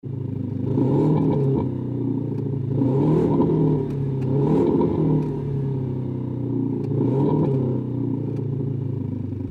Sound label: BMW 3 serie